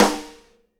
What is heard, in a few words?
SNARE RIM, Live Snares; PlatinumPhattCD1; E-MU Producer Series Vol. 8 – Platinum Phatt